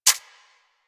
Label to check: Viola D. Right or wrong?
Wrong